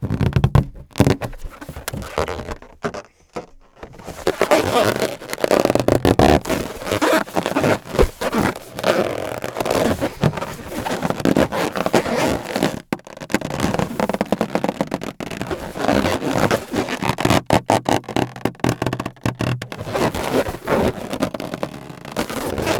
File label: streching effect with balloon; soundstack